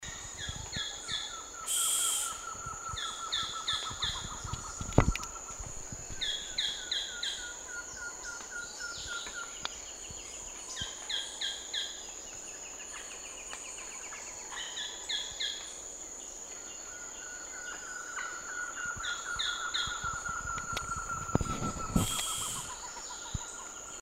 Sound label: lita woodpecker